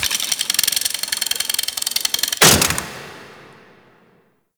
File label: Sentient Damage Limb Rattle; Cinematics; Teshin Intro